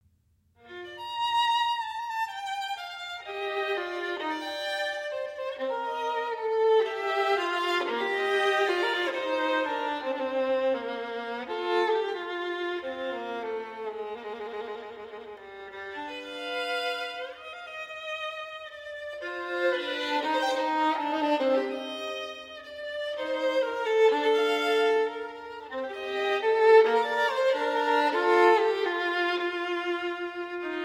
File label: Sonatas For Violin Solo, Sonata No. 1 in G Minor - III. Allegretto Poco; Ysaye; Op. 27